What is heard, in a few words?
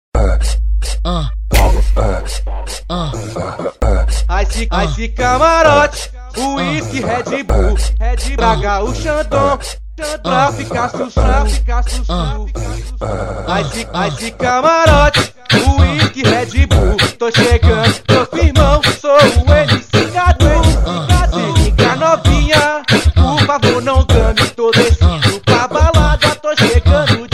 funk ostentacao